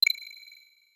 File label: Drip; Super Smash Bros